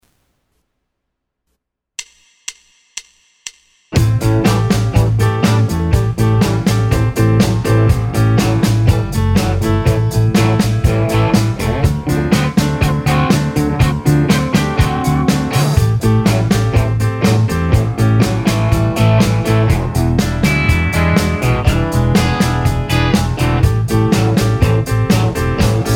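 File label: 12 Bar Rock 122 A guitar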